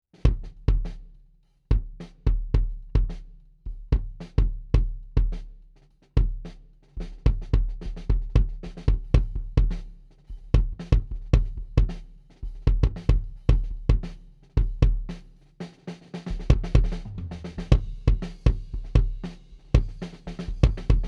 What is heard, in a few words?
Kick Head On 2cm D112